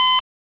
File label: beep loop